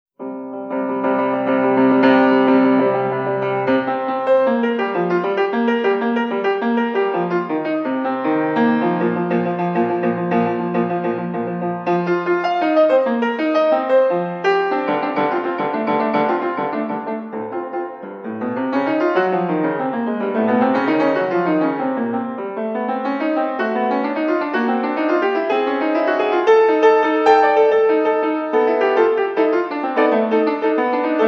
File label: solo piano music, Dance in Bulgarian Rhythm; Юдина; Наследие Марии Юдиной; Bartok; Microcosm